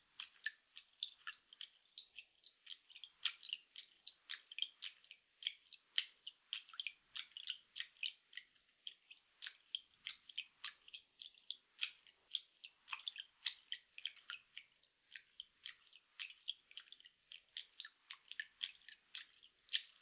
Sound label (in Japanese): ストローク 弱遅, 水音効果音